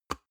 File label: paper box small open hard